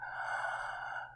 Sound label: sleepy breath